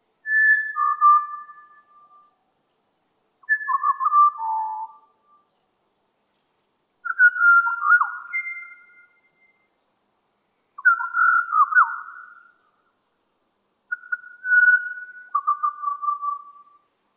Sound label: Pied butcherbird solo